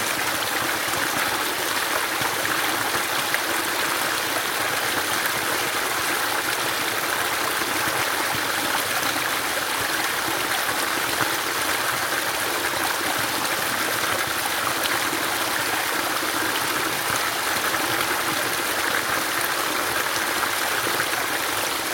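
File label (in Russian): море образует гулкий огромный водопад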